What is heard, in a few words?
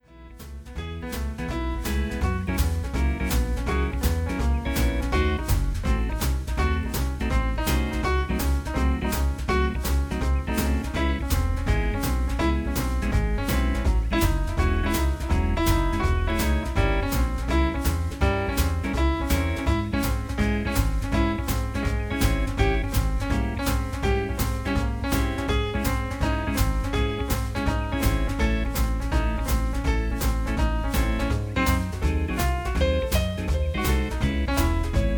Bach Swing